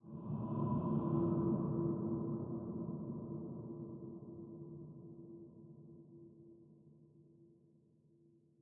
Ambient; Barotrauma; Subsurface

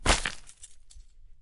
limb blunt; Damage; Barotrauma; Subsurface

